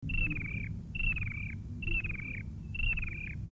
halcyon chelicuti, birds, calls; dacelonidae

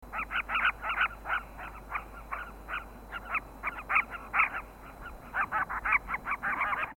merops nubicoides; meropidae; calls

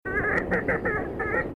porphyrio madagascariensis, birds calls; rallidae

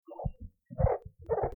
tockus leucomelas, birds calls; bucerotidae

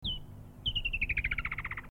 halcyon senegalensis; dacelonidae; calls

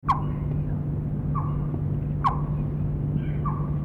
stactolaema olivacea; lybiidae; calls

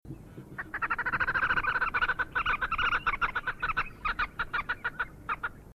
phoeniculus purpureus, birds calls; phoeniculidae

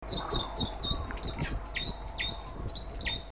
poicephalus meyeri, birds calls; psittacidae

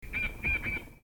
poicephalus robustus, birds calls; psittacidae

